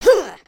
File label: Male Grunt; dialog